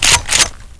m3 pump; cstrike; DEX

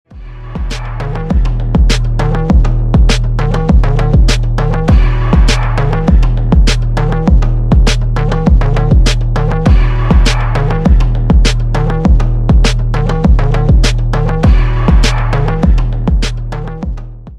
endround; cstrike; DEX

